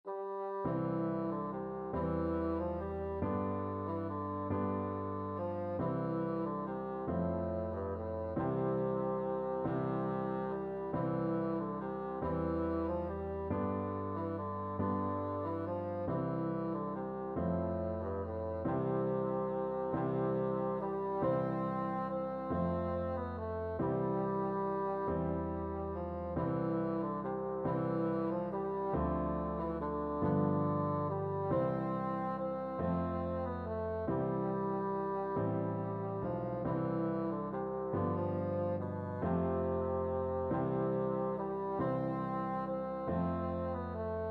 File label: south wind, bassoon